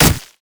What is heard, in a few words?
monkey king basic attack; LoL